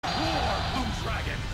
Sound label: roar; BLUE DRAGON